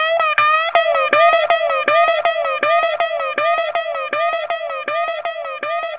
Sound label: Delay Guitar